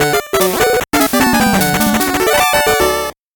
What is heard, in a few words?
8bit jingle fast